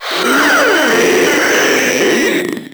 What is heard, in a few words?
Proto Infested Runner Vocal Attack; Warframe